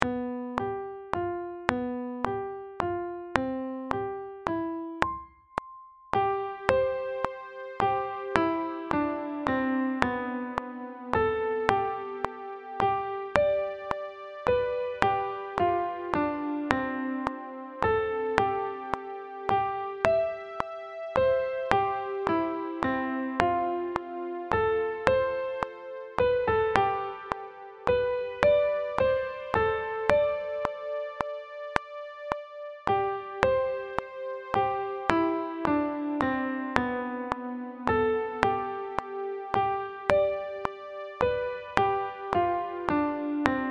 goodnight lead with click

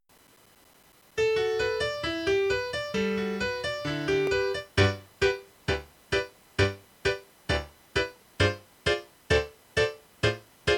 accomp only

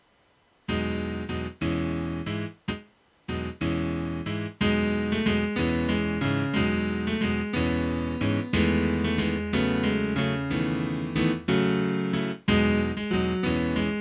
agony with lead

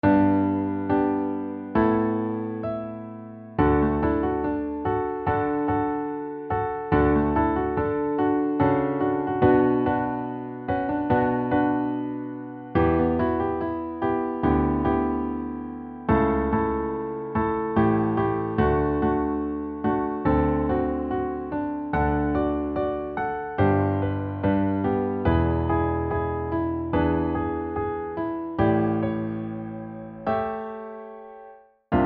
rue plummet accomp

